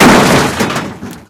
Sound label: break metal